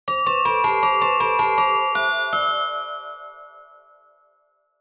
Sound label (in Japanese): のど自慢